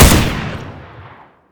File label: m16a4; garrysmod